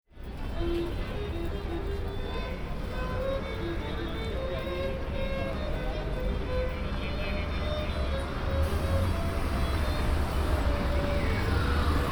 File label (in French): masque violoniste